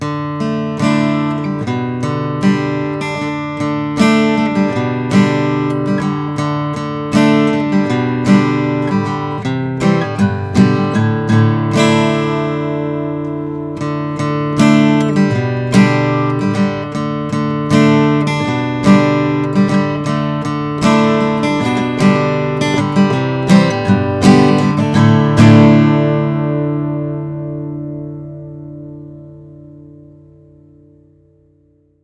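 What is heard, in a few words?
bourgeois 00 150 short scale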